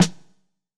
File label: 90s hiphop snare; Reference beat bpm90-A